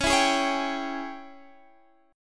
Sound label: G sharp Major 7th Suspended 4th Sharp 5th, C sharp